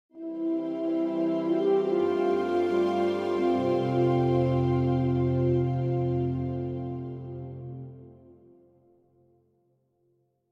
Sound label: Location Jingle New Lore Station; theHunter: Call of the Wild